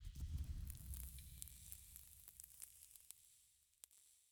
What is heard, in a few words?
rock fall; Rust